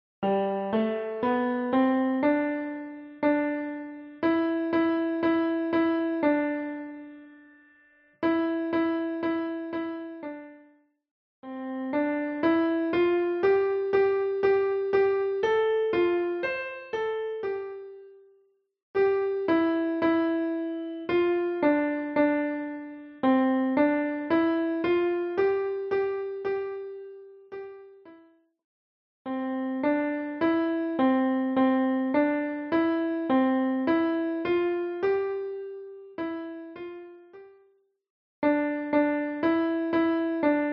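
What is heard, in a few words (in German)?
Kinderlieder, Klavier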